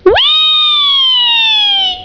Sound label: WHEE